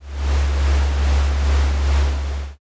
heavy machine

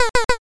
duck

